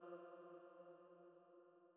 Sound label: Female Pad F2